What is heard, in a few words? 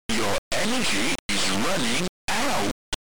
Title AY Beeper; The Arc of Yesod